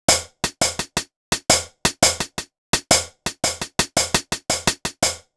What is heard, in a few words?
Hi-Hat, Hi-Hat Loops; KJ Sawka - Drum n Bass & Beyond; Drum Tool Box